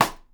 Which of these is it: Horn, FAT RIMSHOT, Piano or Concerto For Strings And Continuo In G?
FAT RIMSHOT